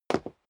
step sneaker wood parquet single stair down; schritte

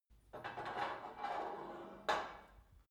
put light weight onto barbell soft, fitnessstudio; sport freizeit

